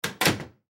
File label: Hospital Door Close; Emergency